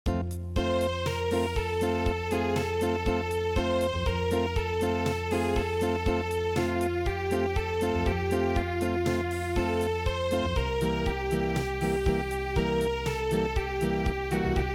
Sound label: Unplugged